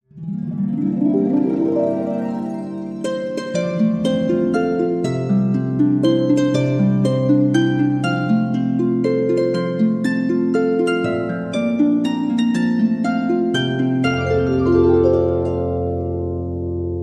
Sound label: Harp Happy Birthday